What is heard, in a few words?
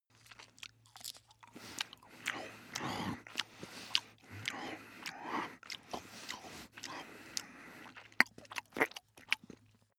dog eat breathe long; natur-tiere; hunde